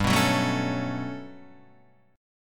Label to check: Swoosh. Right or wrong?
Wrong